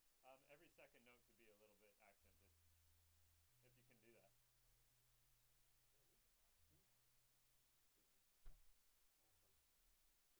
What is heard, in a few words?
Floor